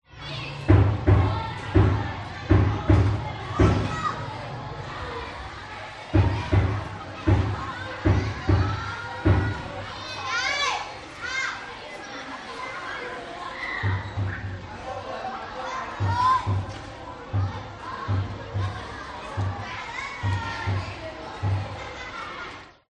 public places school playground children talk laugh drum bang bg vietnam